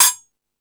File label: Tool Kit, Drum Machines E-MU Pro-Cussion